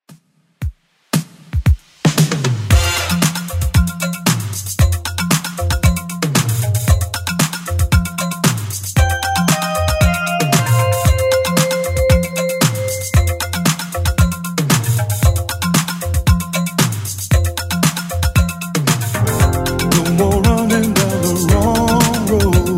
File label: 80s Redrum 115bpm